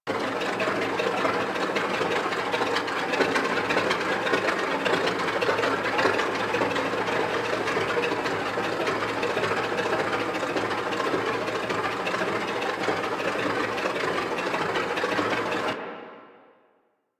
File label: Crew Ship Engine Idle Loop; Warframe; Vehicles; RailJack; Crew Ships; Grineer Crew Ship